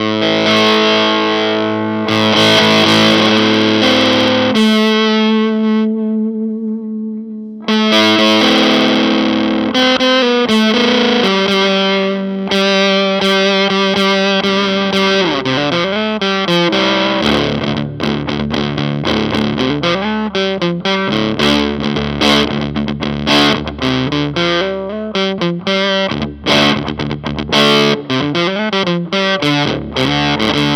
Dunlop Authentic Octavio Fuzz

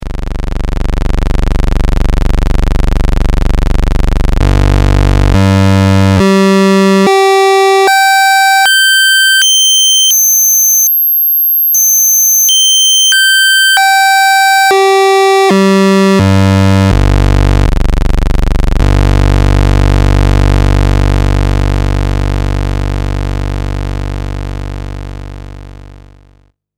Osc Puls 25

